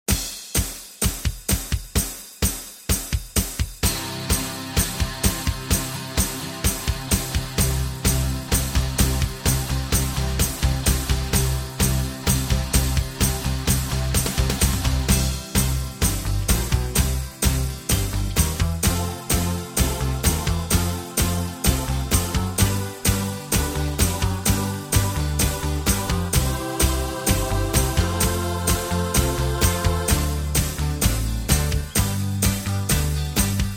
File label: C, backing tracks